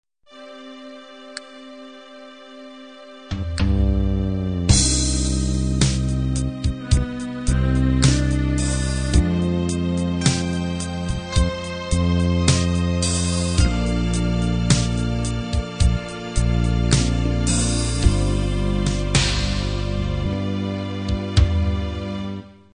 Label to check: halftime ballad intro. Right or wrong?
Right